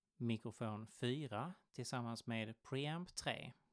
mic pre

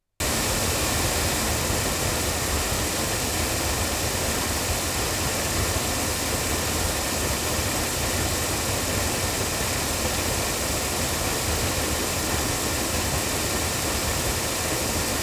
loudspeakers; microphones

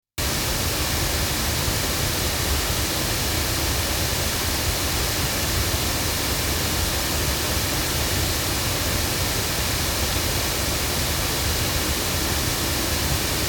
loudspeakers; GoldenAge FC3; microphones

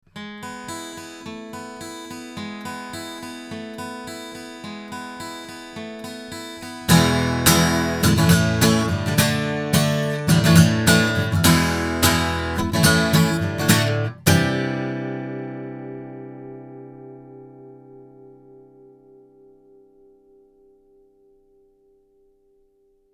CK-7 cardioid guitar; Avant

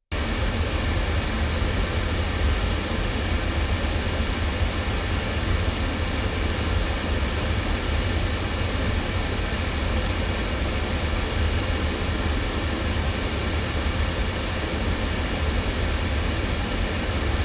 omni loudspeakers; FC3; GoldenAge

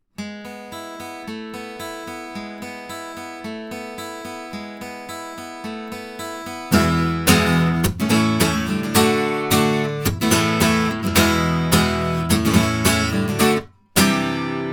guitar Gibson; Violet Dolly; microphones; violet designs

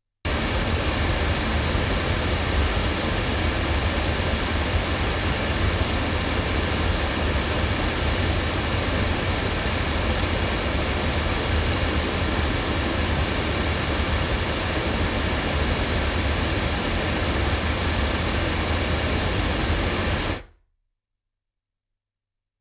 loudspeakers; Pearl CC22; microphones

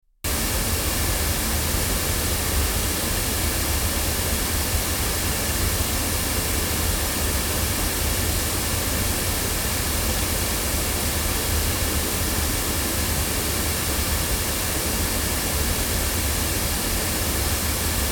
Sontronics STC-2 loudspeakers

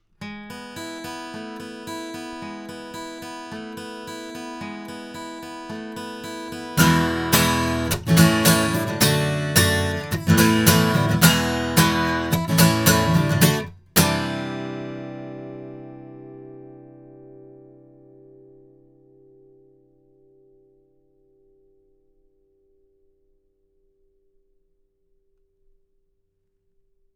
Rode NT2 cardioid guitar

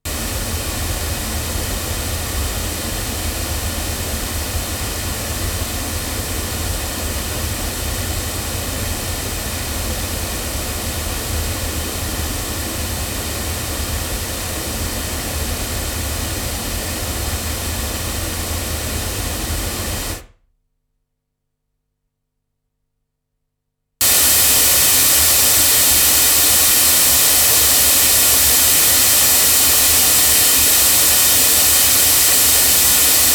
Oktava MKL-5000 omni loudspeakers

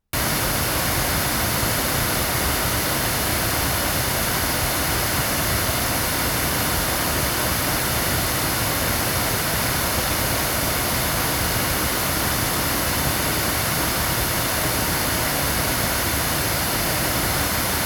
8 loudspeakers; BLUE Yeti Pro; microphones

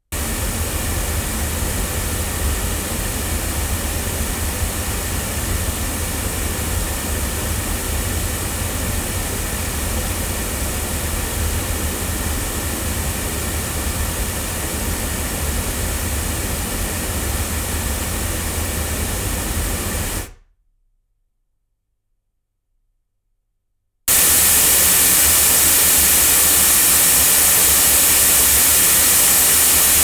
Golden Age TC1 omni loudspeakers

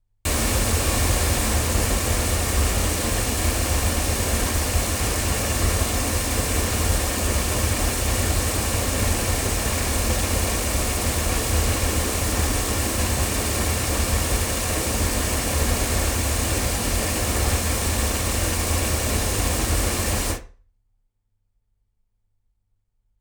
Oktava MK012 omni loudspeakers

